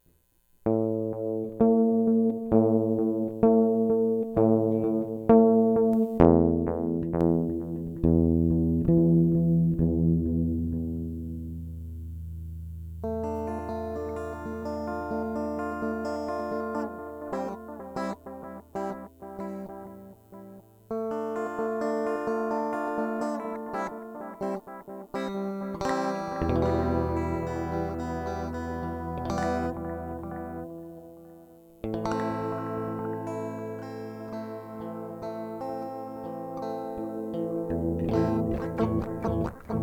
electric; MegaEpix

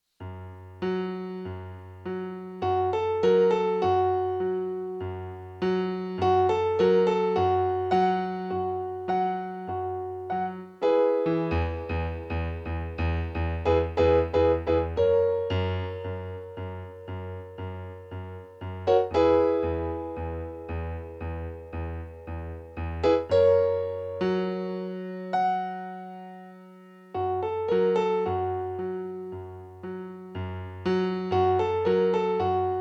piano prelude

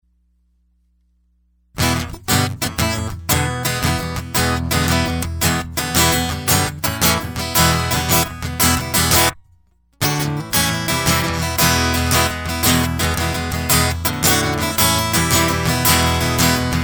Guitar Loop

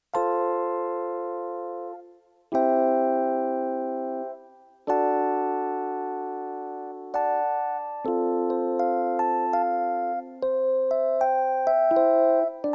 electric piano; MegaEpix

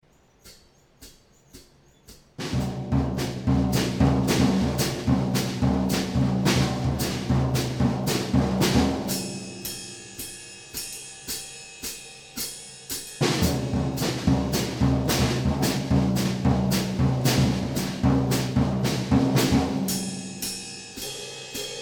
drums; MegaEpix

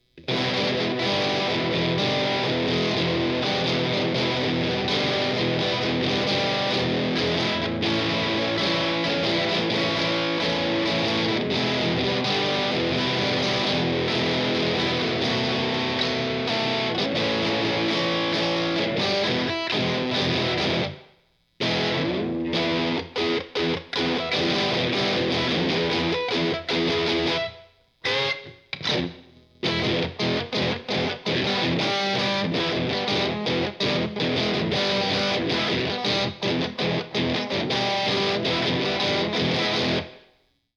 electric; MegaEpix

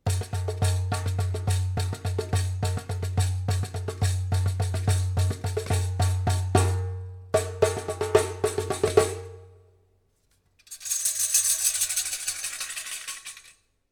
darbuka drum

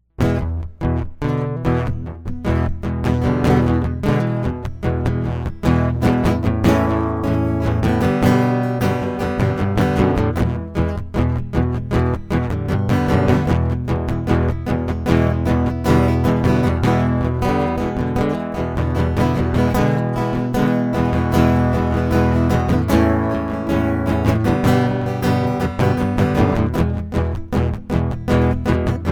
acoustic

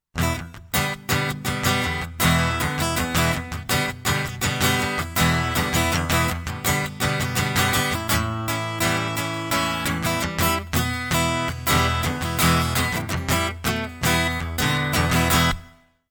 guitar prelude; Tornado Warning

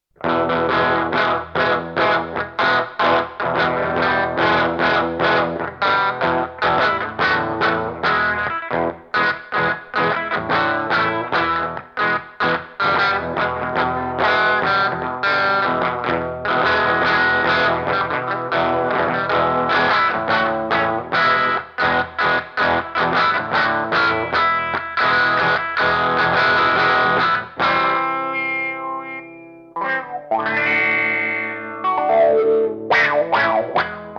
electric

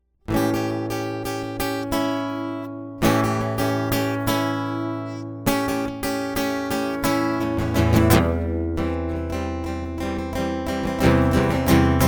acoustic

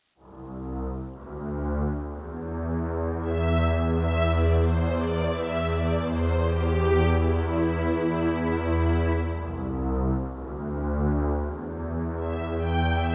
strings; MegaEpix

